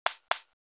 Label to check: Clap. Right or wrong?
Right